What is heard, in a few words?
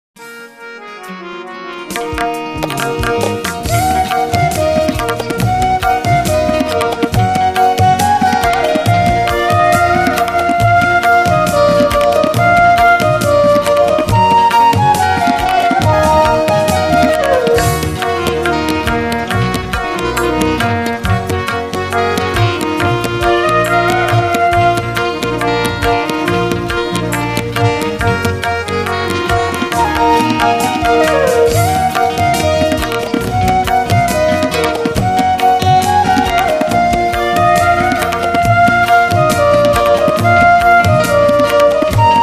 Instrumental